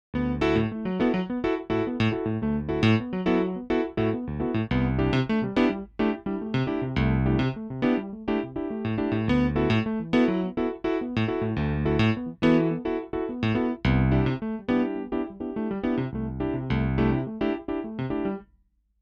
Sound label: cp70 EZKeys Electric Grand; Toontrack